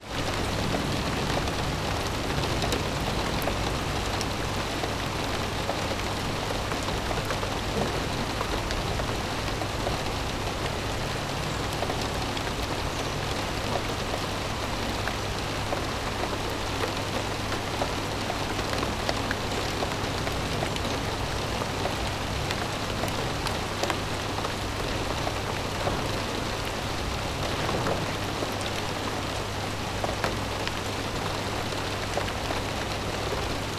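weather rain light glass roof external birds British; uk; zapsplat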